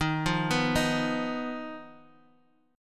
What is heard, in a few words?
D sharp Suspended 2nd Sharp 5th D sharp, Guitar Drop D; chordshape